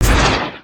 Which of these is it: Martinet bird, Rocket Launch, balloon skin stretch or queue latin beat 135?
Rocket Launch